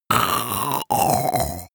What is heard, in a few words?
monster choking zombie; koerpergeraeusche; comedy-cartoon; soundpool